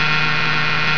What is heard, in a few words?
buzz